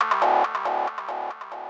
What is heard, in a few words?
KEY SHOT TEKNO 140 A; Techno2; Singleshots